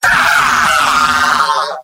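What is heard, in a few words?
pain critical death; Demoman; MvM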